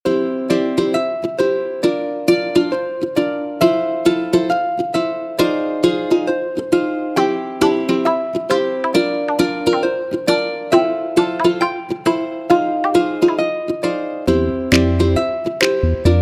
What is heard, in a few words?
Ukulele; MIUI14 and HyperOS 1.0